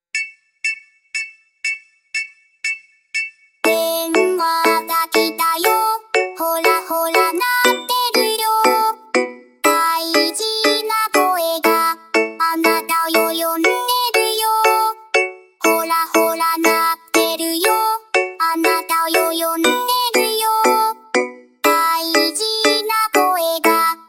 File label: cute phone call ringtone